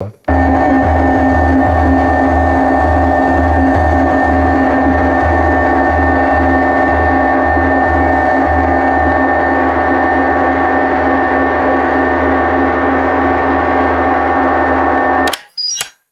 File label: rhythmic buzzy electronic submarine alarm; alarms